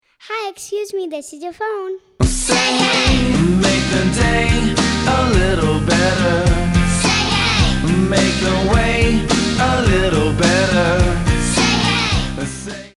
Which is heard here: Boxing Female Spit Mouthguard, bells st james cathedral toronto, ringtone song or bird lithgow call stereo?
ringtone song